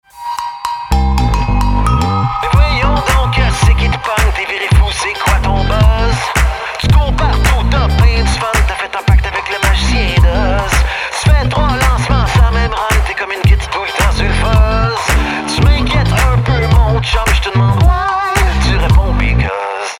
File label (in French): Chant rap